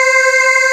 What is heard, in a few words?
Vox Wave 2 C5; Digital Sound Factory Xtreme Lead